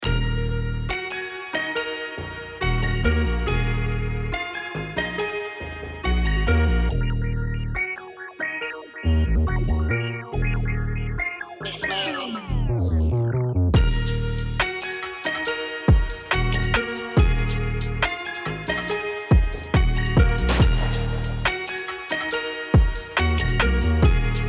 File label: Drill